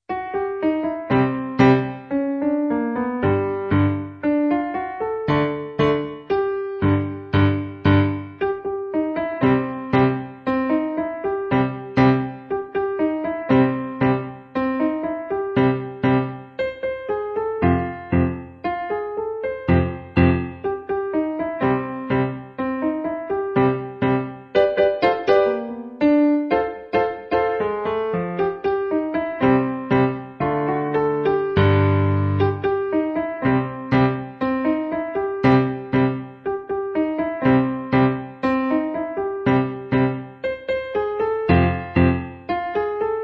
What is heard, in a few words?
Rock piano